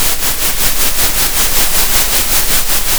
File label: center, Sound of a train